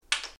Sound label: knack